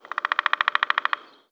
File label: Bird Call